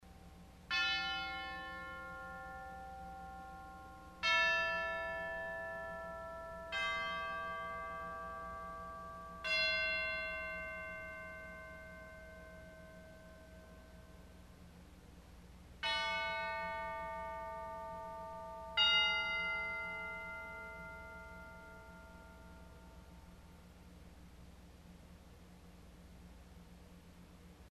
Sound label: Chimes Arpeg Hi Low, organ stops; CulverAcademy